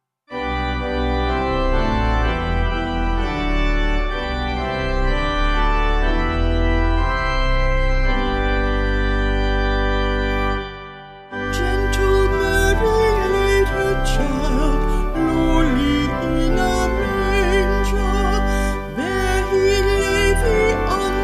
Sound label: Vocals Organ